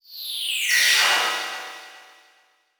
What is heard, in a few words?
Transition Whoosh Fly By; Production Element; Title Transition; AdobeCC